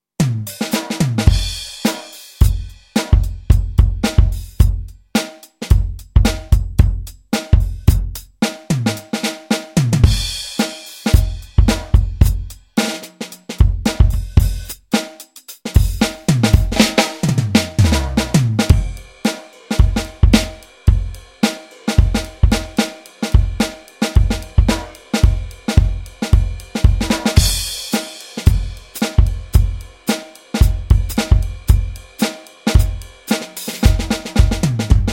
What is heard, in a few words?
live funky drumming